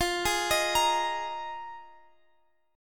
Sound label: F Minor 11th, Guitar Open F; arpeggiated